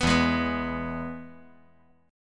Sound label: E-Major E